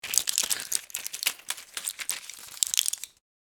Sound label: Foil blister pack handling and crinkle; medicine